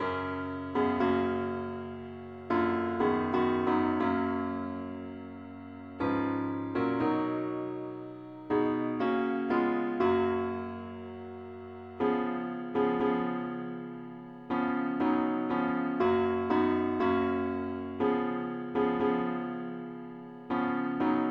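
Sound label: parts only key g flat; pilot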